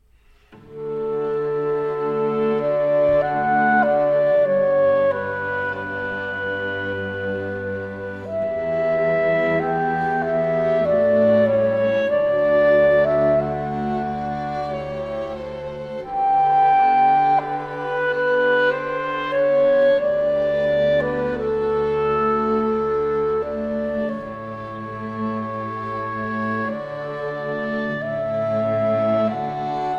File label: Quintet For Clarinet, Two Violins, Viola and Violoncello in A Major